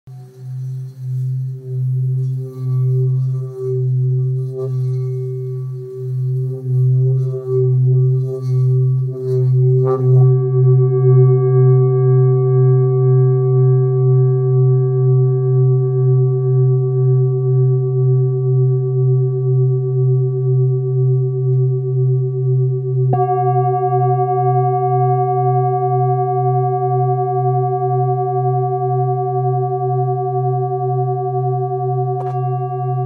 Bengale sol 1.4-1.5kg, bol chantant 7 metaux

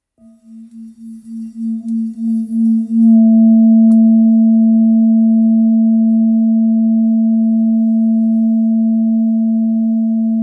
Bol full moon mi 869g; Bol Chantant Full Moon

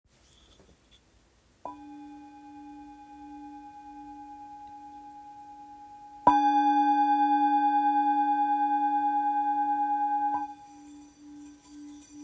Bol chantant ancien de l'Orissa - note ré; Présentation des bols tibétains de l'Orissa, bols anciens en 7 métaux

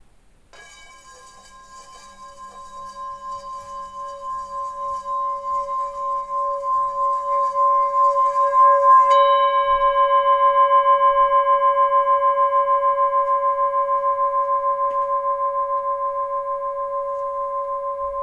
bol bengale 1-1.1kg Do dieze

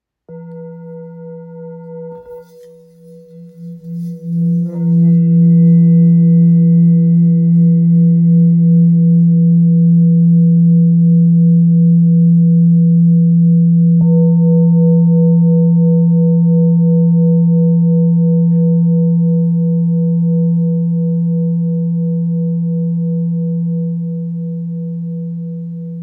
Bol bengale mi

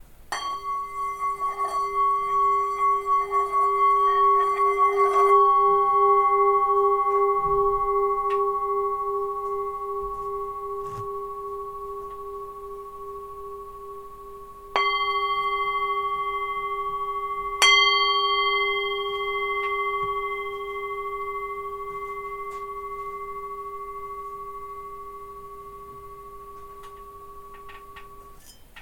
bol bengale Sol

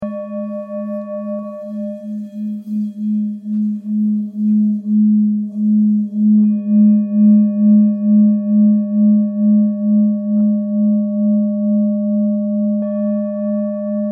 Bol thick sol diese

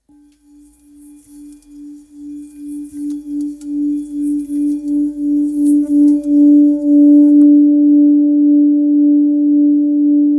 Bol pleine lune froide re; Bol Chantant Full Moon

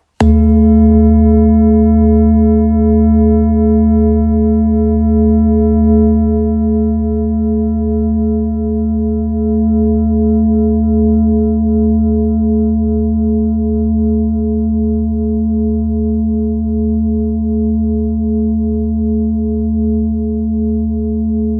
Bol chantant therapeute re diese

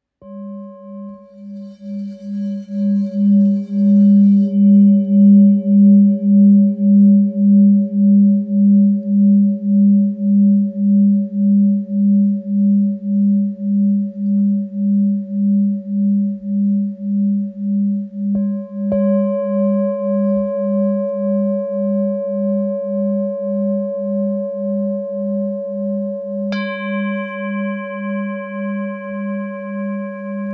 Bol ancien sol diese

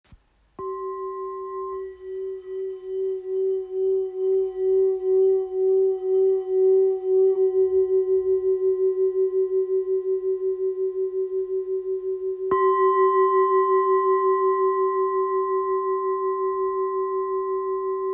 Bol ancien fa diese

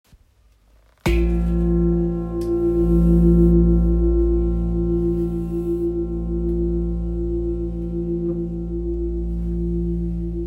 Gros bol tibetain la diese 8.46kg

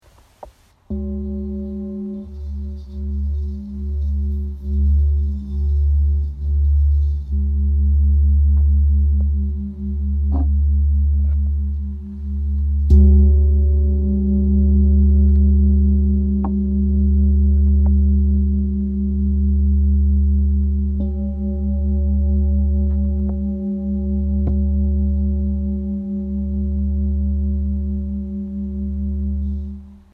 Bol ramgandi 1.65kg re

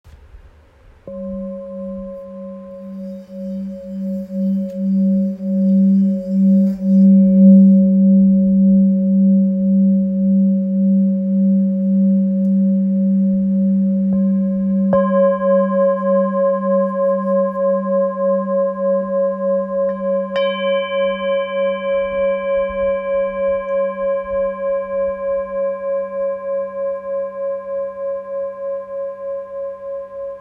Bol ancien sol

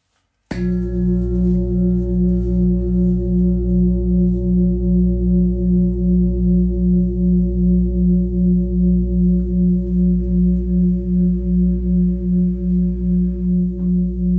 Gros bol grave la diese 9.9kg; Bol chantant gros volume 4kg et +; bouddha

